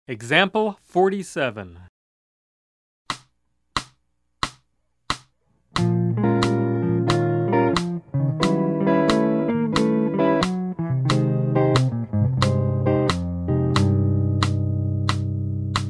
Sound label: Jazz